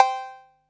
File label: 808 COW, TR-808